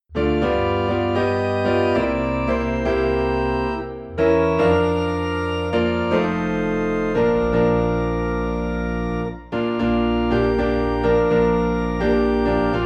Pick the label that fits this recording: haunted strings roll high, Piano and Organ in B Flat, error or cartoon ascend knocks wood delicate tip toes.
Piano and Organ in B Flat